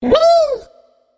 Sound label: connect; monstergo; pokebot